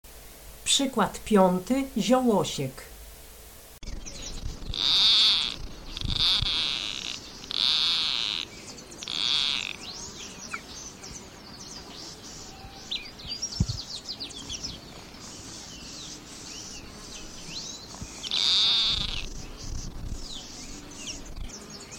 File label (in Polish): ziołosiek